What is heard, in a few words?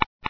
geiger; Source Engine; video game